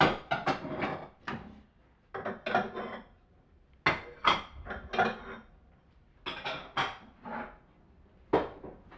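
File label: Memory Cake Ambient; the princess and the frog; Diner; Dishes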